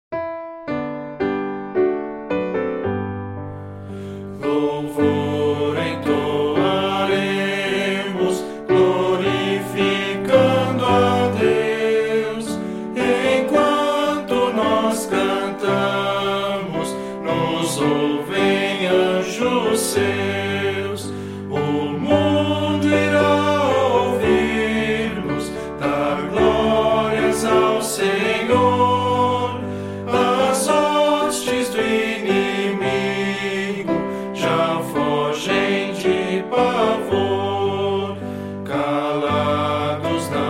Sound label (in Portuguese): voz piano